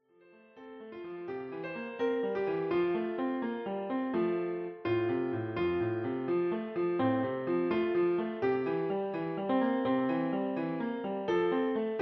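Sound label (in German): Herbei o ihr Glaeubigen, Klavier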